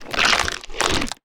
骆驼进食声

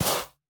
灵魂沙踩踏声

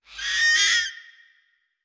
狐狸尖啸声

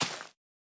大型垂滴叶向下倾斜声

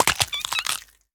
幼年海龟破壳声

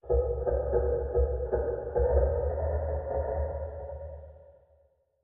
玄武岩三角洲重咔嗒声; ambient

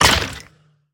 凋灵骷髅受伤声

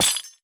锁链破坏声

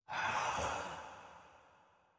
灵魂疾行声

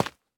凝灰岩踩踏声

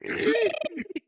开心声; 炽足兽

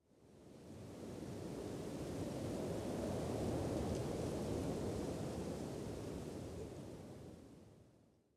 灵魂沙峡谷风声; ambient

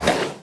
熊猫咬声

